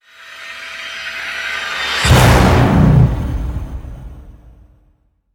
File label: Hit Orch Release The Beast B